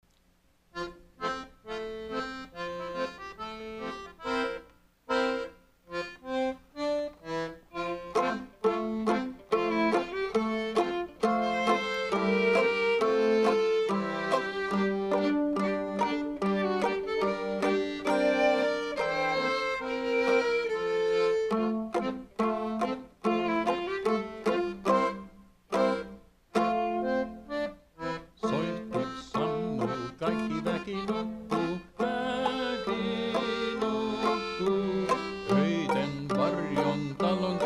Christmas Tune

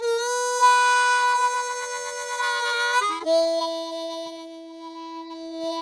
harp; Verdigris Music

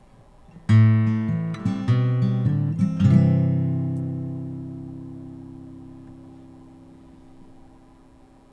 Instrumental Into Reading; Copper Camp Audio Book

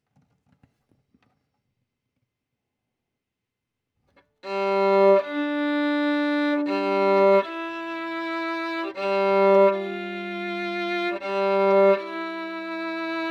Violin

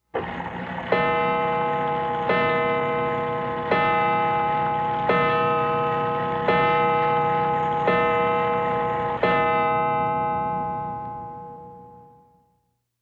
Mantel Clock

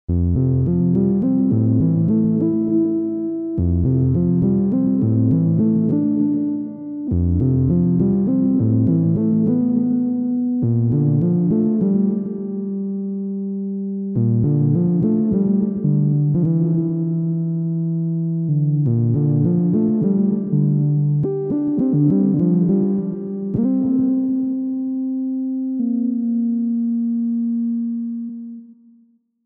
Evening News Theme Spacer - Mysterious Synth Lead; News Hour

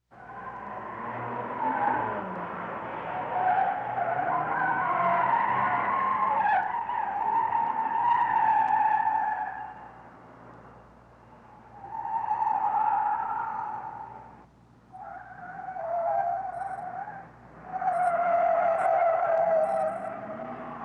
Car Chase

